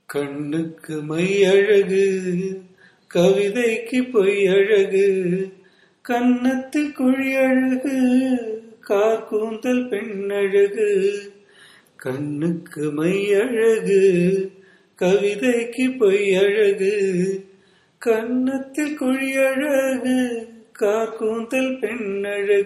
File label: raga hari kambhoji cine, carnatic music